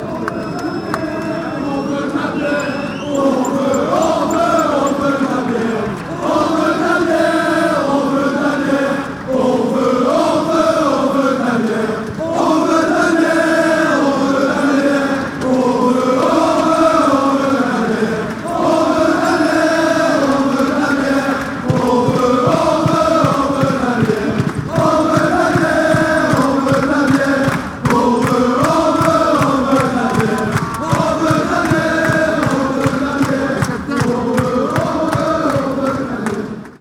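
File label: cheer song, football songs and soccer chants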